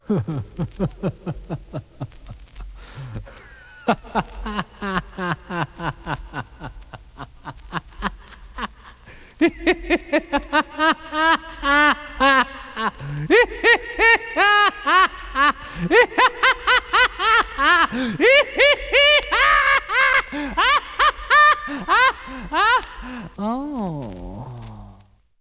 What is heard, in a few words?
Laughs; Tiny Tim